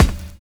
FAT DISCO; Univers Sons

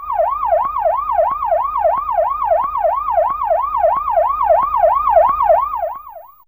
Siren, Fairlight CMI IIe; Effects3

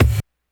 kick, Hardcore Breakbeat; Big Fish Audio; Hardcore Breakbeat Action; Rhythms 4 Life 151 Ebmin

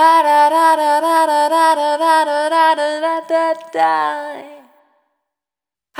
Output, Single Voice Melodic Loops

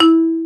Marimba E3; Digital Sound Factory Virtuoso

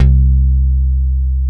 C-4 PICKED, METALLOID; AKAI S-Series CD-ROM Sound Library VOL-7

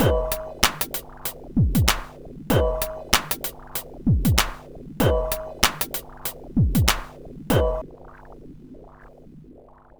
DRM 096, Breakbeat; Best Service ProSamples vol.24; AKAI

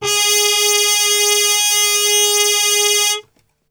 G#2, Brass Super Section TRP HARMUTE; Best Service

